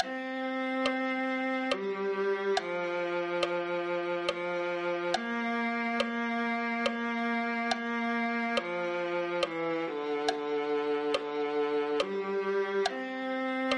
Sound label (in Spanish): cuanto anhelamos la venida viola